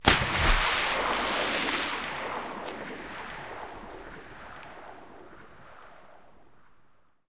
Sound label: Water Enter; Quantum Redshift